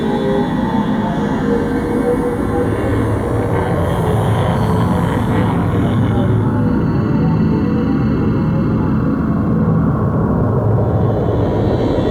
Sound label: Interior Office; Warframe; Corpus